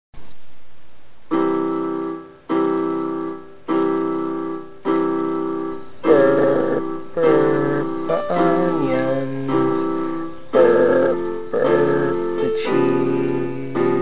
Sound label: burp burp; jackdaws